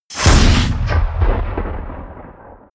rpg shot close

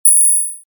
high frequency cut

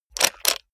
robot handling

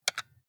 console controller xbox xyab press hard

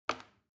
plastic button small release heavy

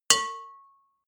bowl hit

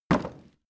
wood drop

